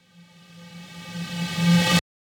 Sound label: Tubular Tonal Reverse - F; House; FX